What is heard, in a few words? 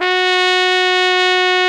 F#4, BRASS; AKAI S-Series CD-ROM Sound Library VOL-8